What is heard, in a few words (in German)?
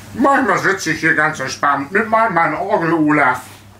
Orgel; Töne eingespielt; Orgel Olaf